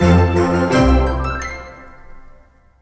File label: level Complete; JumpinJack